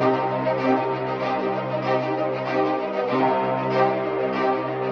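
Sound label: strings, Sad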